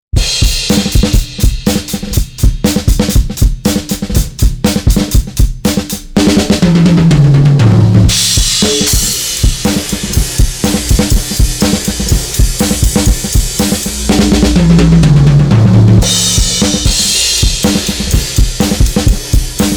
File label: drums Buss; Nanopulse; Massive